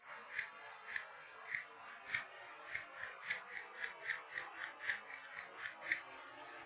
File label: Castanets Violin Applause